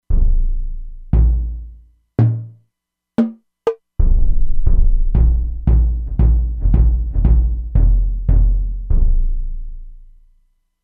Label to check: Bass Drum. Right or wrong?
Right